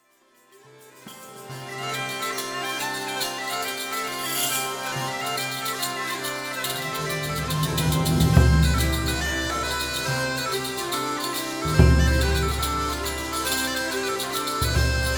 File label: Ductia